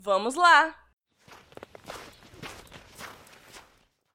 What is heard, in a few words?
Walk; Elane